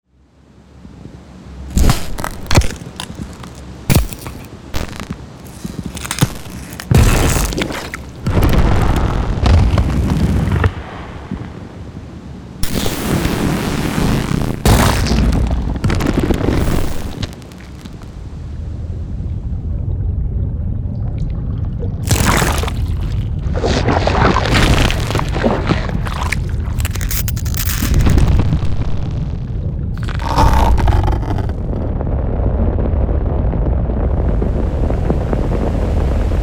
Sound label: Biohazard Organic Textures and Ambiences; Bluezone